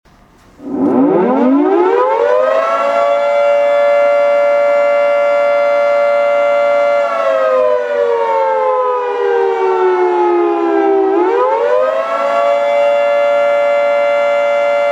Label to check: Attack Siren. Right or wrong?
Right